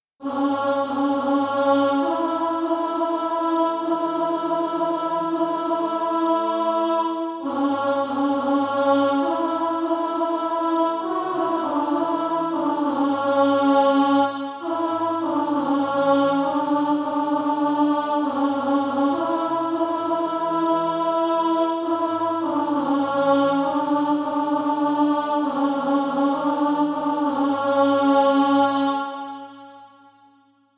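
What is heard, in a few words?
alto, SATB Practise Music; Deeper Yet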